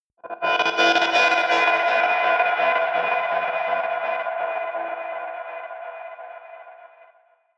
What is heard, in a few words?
stutter breach, drones